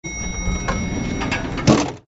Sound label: Elevator door open